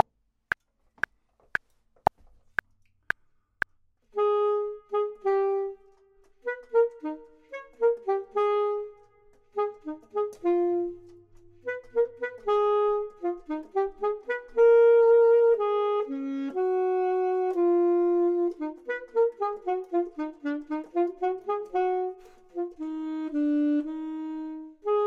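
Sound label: bottom, Saxophone and Clarinet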